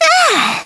alert; teddy

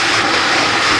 fire extinguisher loop

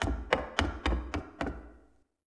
wood run by

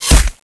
flesh

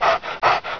dog

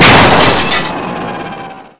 break moto

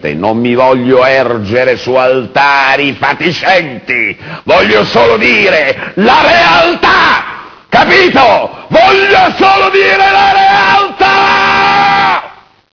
zombie idle

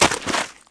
pl dirt jump, player

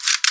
greaser cock, weapons

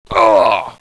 leg hit

